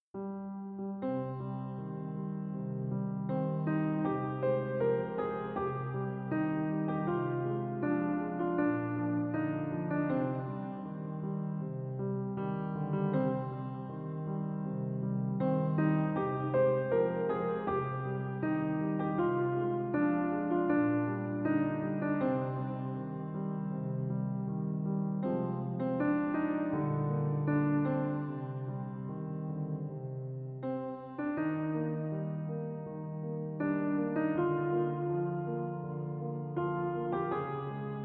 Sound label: Minor Piano Ver; Kirby